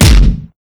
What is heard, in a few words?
base drum; DRUMS